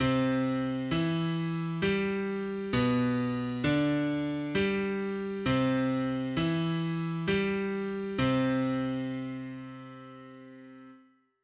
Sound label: sing midi c